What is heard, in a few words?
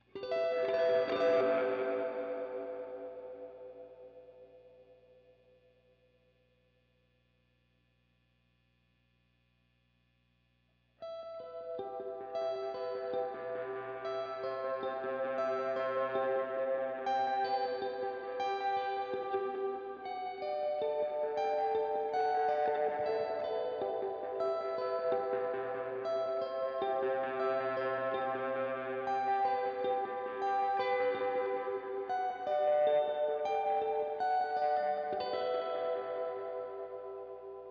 strat r121